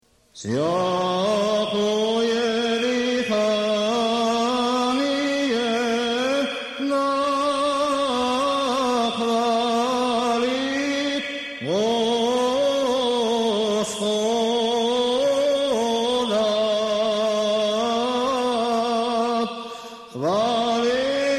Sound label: osmoglasnik iv glas